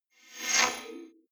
flyby splutter

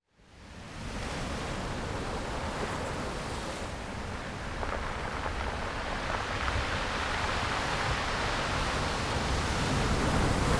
waves breaking on beach

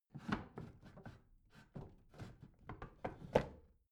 bathroom drawer open rattle

